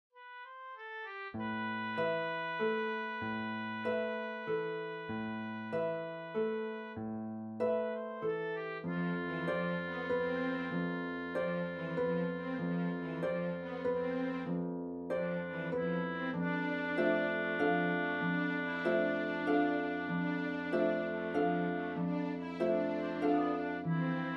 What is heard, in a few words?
music float old; A Murder In Fairyland; SeaSounds